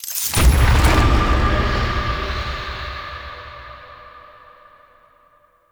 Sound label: Hard Mode Book Stinger; Tau; Perita Rebellion